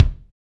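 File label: bass drum, Roland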